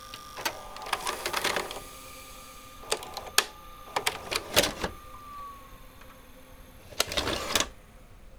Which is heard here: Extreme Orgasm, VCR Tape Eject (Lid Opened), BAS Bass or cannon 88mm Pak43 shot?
VCR Tape Eject (Lid Opened)